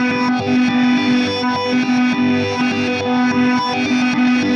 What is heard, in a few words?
Reverse Guitar; Sonic Foundry (Sony Creative Software); Indie Rock Anthems; Session 02 - Clinical